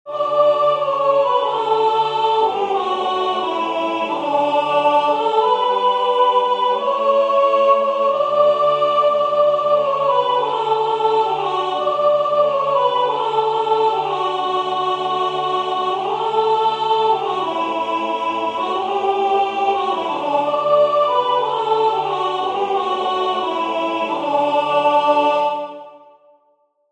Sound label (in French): soprane; Joy to the world; Concert du 13 décembre 2024 en l'Église St Pierre - St Paul